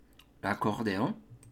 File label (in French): l accordeon